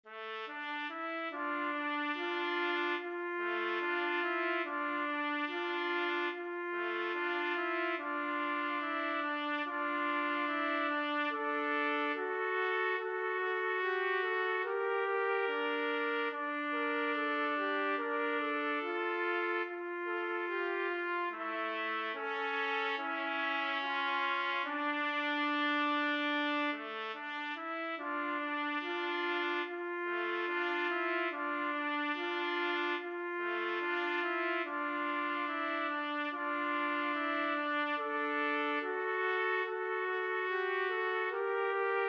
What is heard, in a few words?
bella ciao trumpet duet